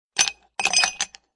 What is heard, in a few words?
ice in a glass